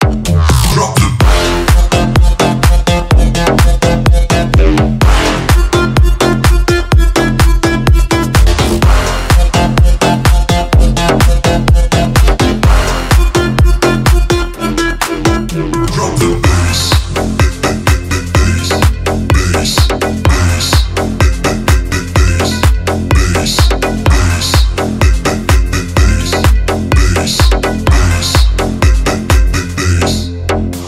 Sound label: drop bass